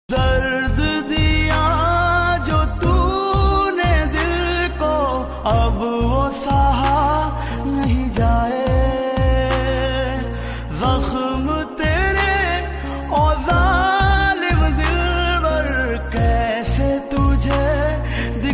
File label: Sad Song Ringtone